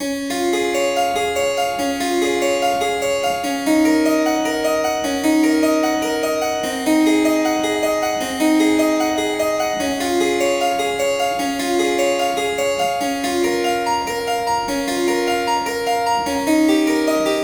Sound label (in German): Werckmeister C-Dur Cis